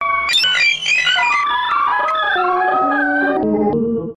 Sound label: bleep bloop